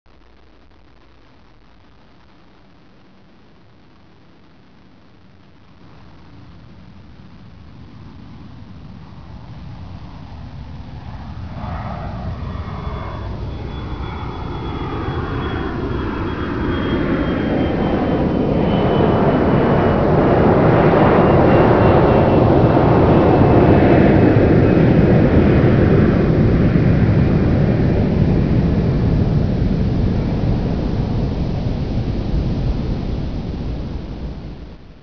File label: airplane; industrywest